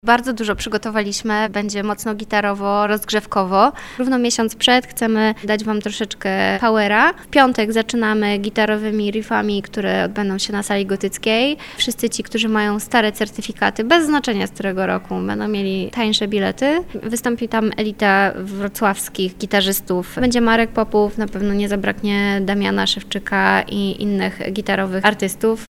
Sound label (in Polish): news gitary